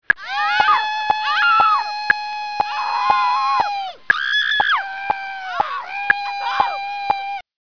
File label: scream